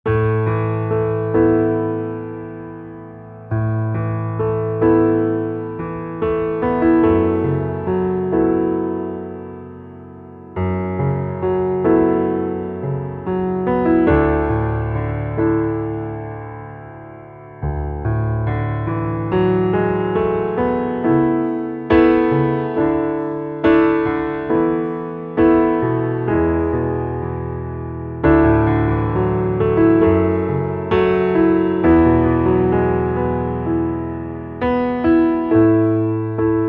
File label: Underscore PIANO; Worship Flow